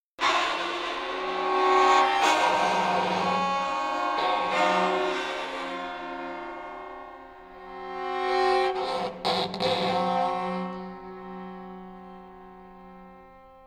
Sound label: Bubbles